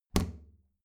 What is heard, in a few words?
plastic trash can close regular